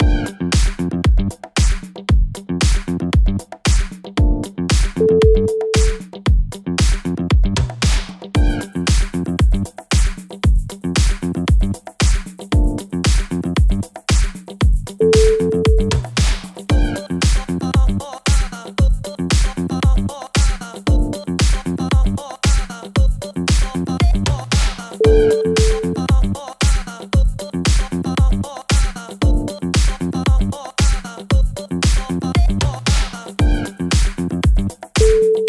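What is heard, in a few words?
disco machine, music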